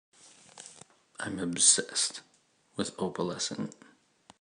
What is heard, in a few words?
Shhhhhhh